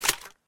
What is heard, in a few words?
Shotgun Insert; Criminality